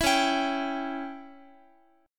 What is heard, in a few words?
C sharp Diminished C sharp down Guitar 4A 4E 5A 5D 5G sharp 6C sharp; C#dim strummed; JGuitar